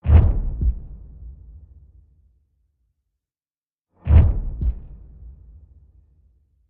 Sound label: Sting Heartbeat